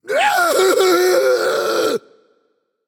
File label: fear; Smite; camazotz